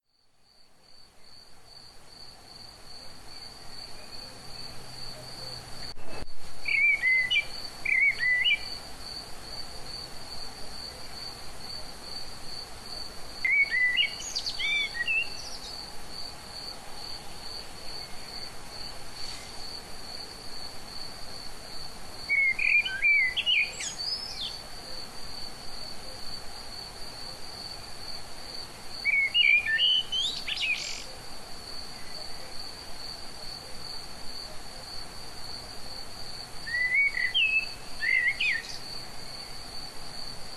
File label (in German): Amsel